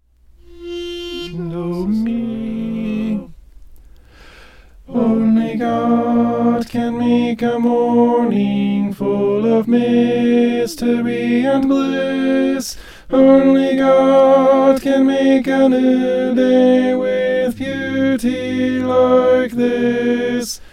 only god can make tenor

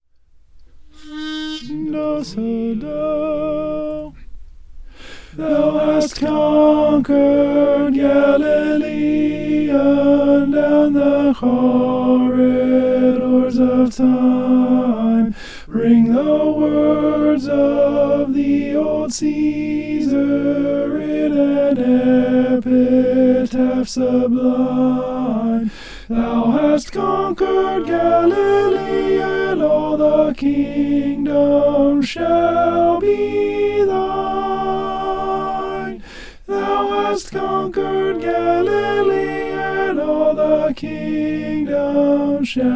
thou hast conquered slow alto

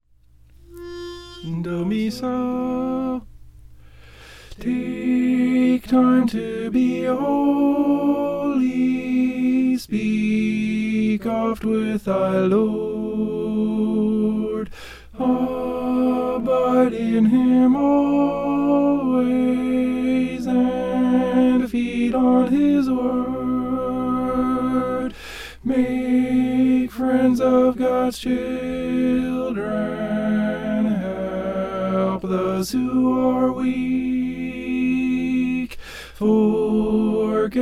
take time to be tenor

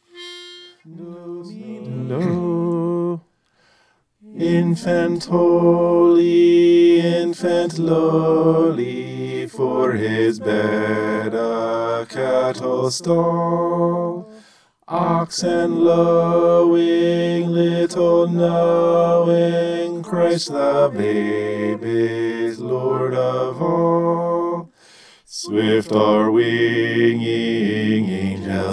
infant holy bass

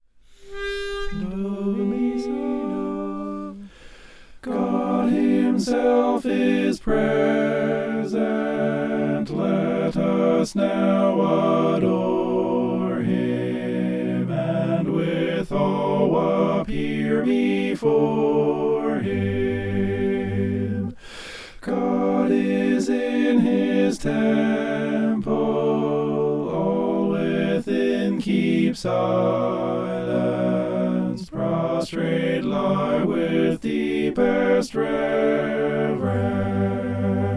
mixed

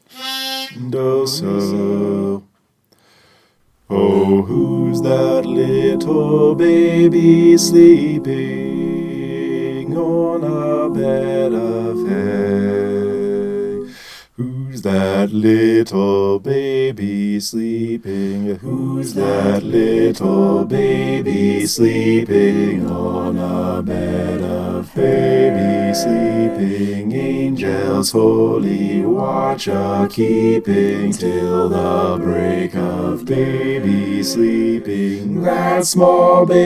whos that little baby bass

